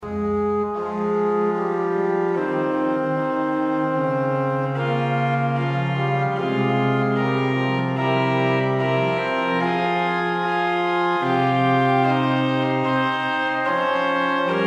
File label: reeds 8 Oboe 8 Trompete